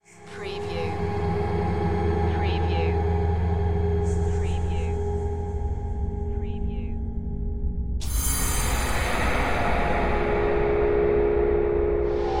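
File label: Production Element Bed Haunting; Sound Effects Bible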